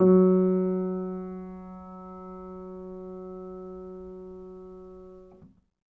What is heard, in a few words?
Acoustic Piano